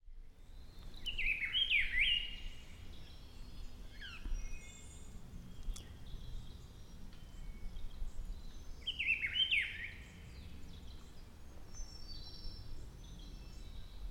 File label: cyclaris gujanensis; Irazú; historia natural